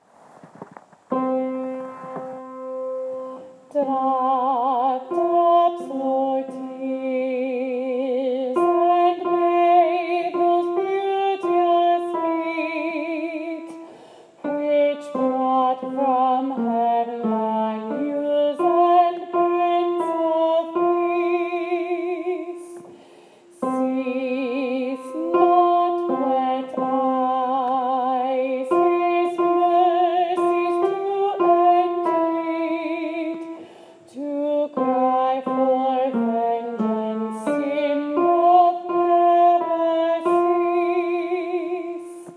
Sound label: alto, choir practice tracks; holy week choir practice tracks